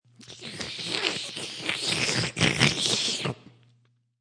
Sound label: Slurp